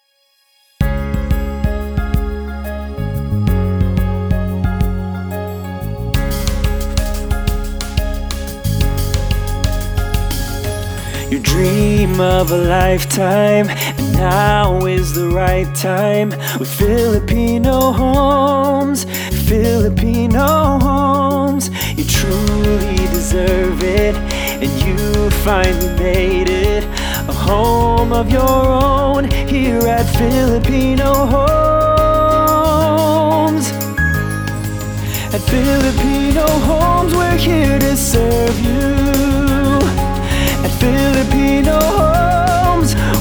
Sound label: corporate jingle